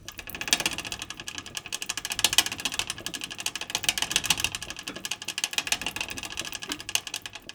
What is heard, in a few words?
Submarine Crank Normal